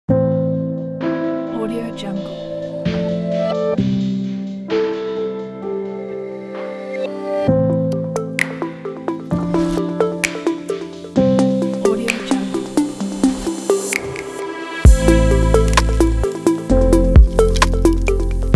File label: gentle abstract